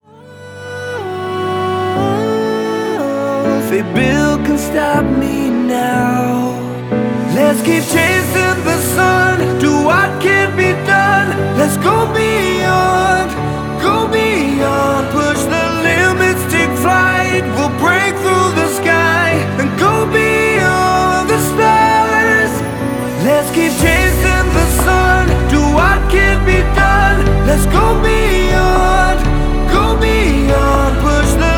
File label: Male, ringtones; Honor; X9c